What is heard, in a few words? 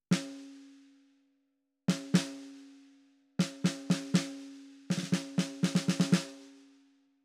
Snare